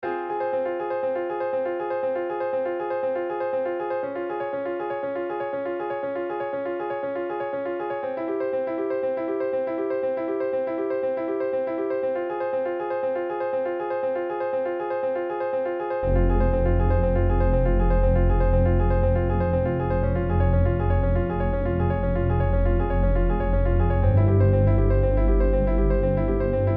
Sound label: piano madness